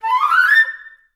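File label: flute run up A3; Best Service; Peter Siedlaczek's Advanced Orchestra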